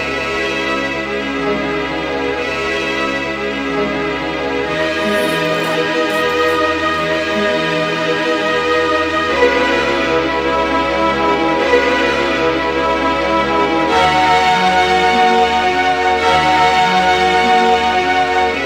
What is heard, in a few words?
keys, jazz loops